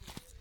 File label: Walking